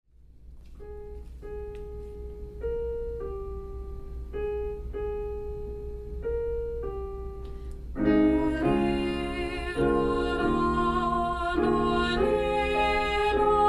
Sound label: Lully Lulla Girl Alto; Lully, Lulla, P. Stopford